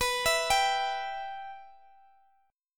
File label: B Suspended 4th Sharp 5th B Guitar Standard, chord; arpeggiated; Bsus4#5; x,x,21,21,20,x; JGuitar